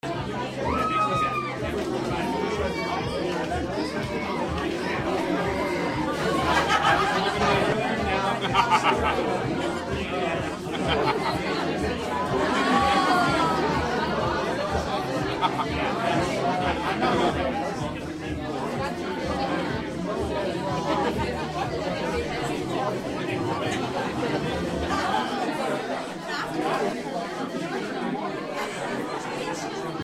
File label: graduation party crowd